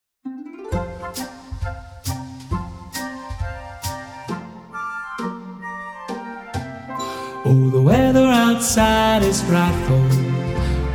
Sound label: TENOR